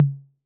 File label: Tom Mid B 808, Clean, Digital